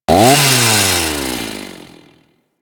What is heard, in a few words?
Ghoul Sawman Saw Loop High Start; Warframe; Grineer Ghouls